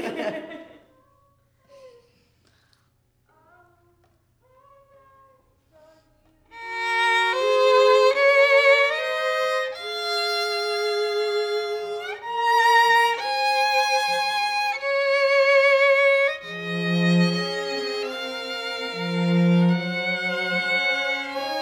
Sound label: violin